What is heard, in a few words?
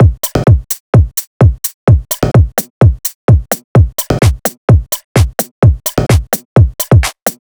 Gqom Drum Loop